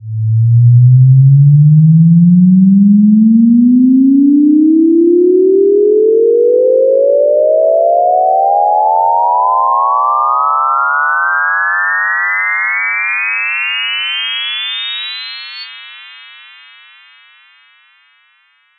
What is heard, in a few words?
Sweeps Long